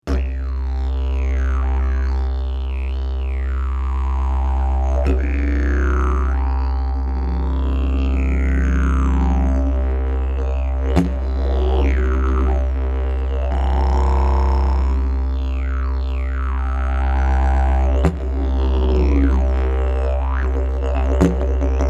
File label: didge